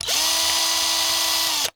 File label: Cordless Electric Drill; Tools; 1001 Sound Effects